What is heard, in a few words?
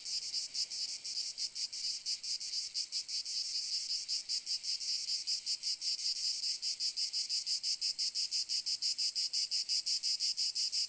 cicadas